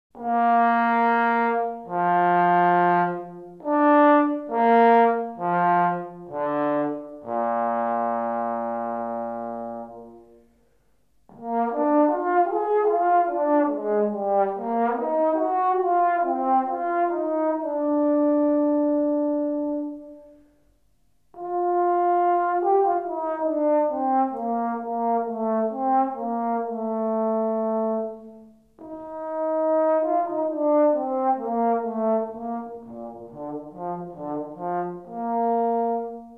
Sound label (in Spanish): Sonido de trombon; Instrumentos de viento; Viento-metal